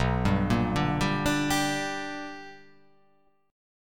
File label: B Minor Major 7th Sharp 5th, chord